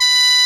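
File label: sheng A#3; AKAI S6000 CD-ROM - Volume 1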